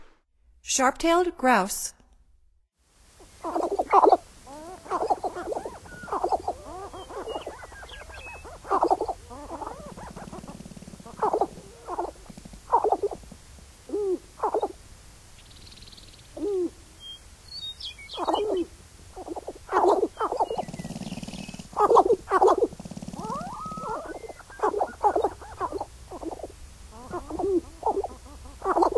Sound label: Sharp-tailed Grouse, Songs of Birds of North America; Stokes